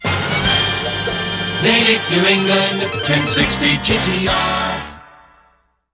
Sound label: jingles